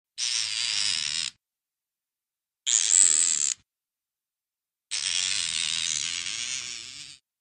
thread; باز کردن قرقره

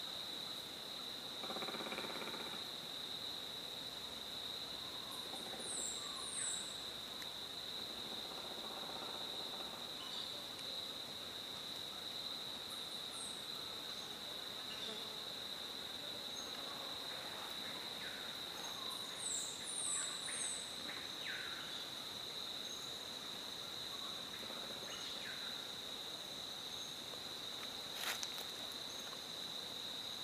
Ringed woodpecker

